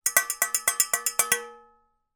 impacts metal whisk hit inside empty metal bowl multiple